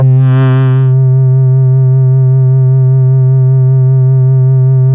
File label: Merge Syn C4, Fairlight CMI IIe Keyboards; Keyboards2